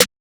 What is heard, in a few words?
Snare